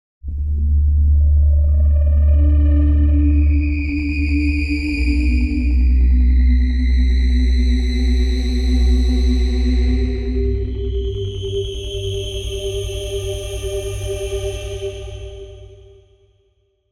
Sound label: Kaox FM FX1